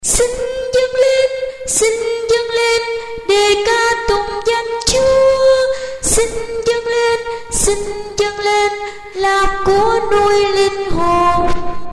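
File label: soprano tenor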